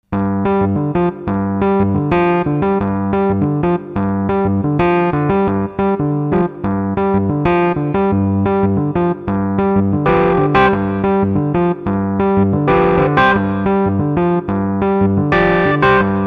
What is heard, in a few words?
S90 VINTAGE BLUES JAZZ